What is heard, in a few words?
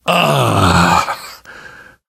pain relief sigh; source engine; left 4 dead 2; namvet